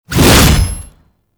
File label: Strike Leap Whoosh; Boss; Enemies; Abilities; Warframe; Oraxia